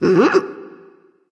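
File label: nonverbal agree; smite; ratatoskr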